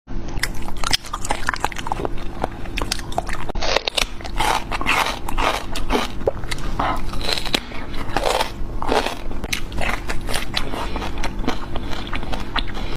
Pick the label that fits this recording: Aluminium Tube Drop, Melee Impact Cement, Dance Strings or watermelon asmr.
watermelon asmr